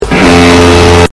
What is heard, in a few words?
ship horn; made with Voicemod